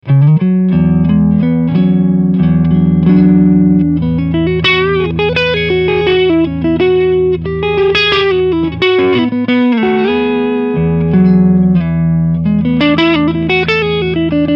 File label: Blues Poweramp